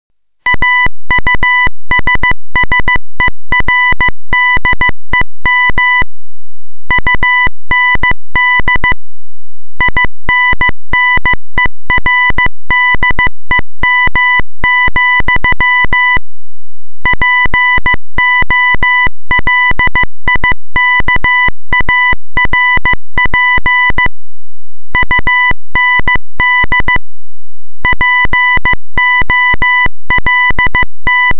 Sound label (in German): morse; Ausserdem und Innerdem